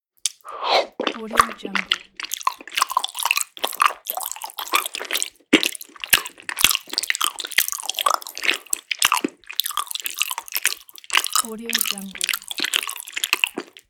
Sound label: munching